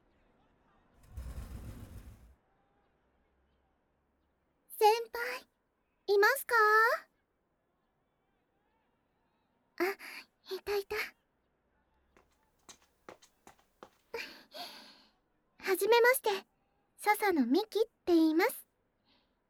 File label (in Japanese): ご奉仕 フェラ; 初めてのご奉仕フェラテスト